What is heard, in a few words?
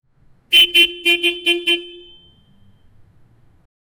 bicycle horn honking